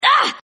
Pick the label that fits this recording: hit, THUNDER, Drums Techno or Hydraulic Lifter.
hit